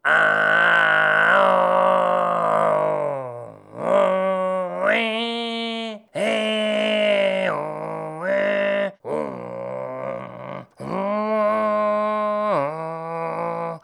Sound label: sing